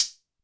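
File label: Hi-Hat Open Analog Short; YAMAHA RM1X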